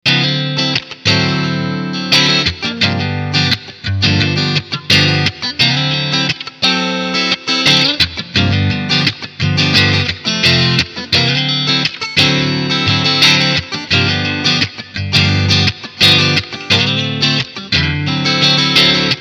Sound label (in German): Clean Front und Middle